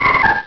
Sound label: ralts, cries; direct sound samples